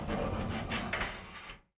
metal stress; ambient; Counter Strike Model Pack; CS:GO; survival

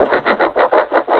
laugh, combine suppressor

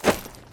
jump launch, footsteps; player

